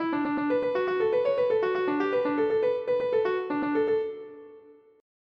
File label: AI Melody Generator